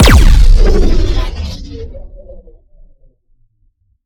Shot Large Laser Electron Cannon

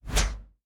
Synth Whoosh

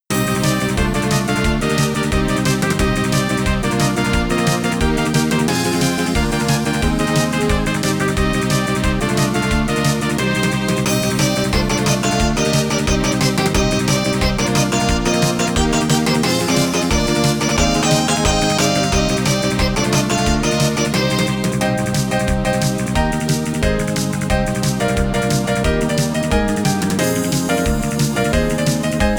Stairs (OPL4)

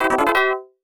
Coin; Rod Land; Jaleco; Mega System 1; arcade

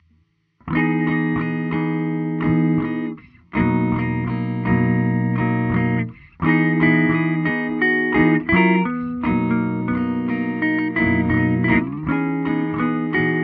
Pleasant Guitar; Hylics

